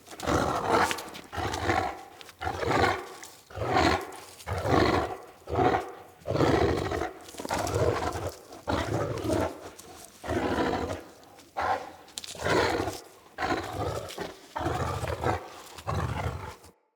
Battle Dog Attack Breathe Snarl Walk Loop, Animals; Vocals; Snc Battle Dog